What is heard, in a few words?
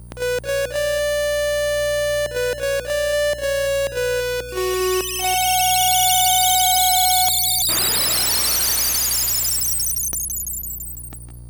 Organ; Links Awakening Original Soundtrack